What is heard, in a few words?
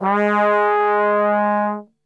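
G#2 trombone gliss, Brass; Best Service; Brass Super Section; TRMB GLISS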